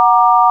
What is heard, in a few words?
sine; ものづくり基礎工学（情報工学分野）; 音の波形と分析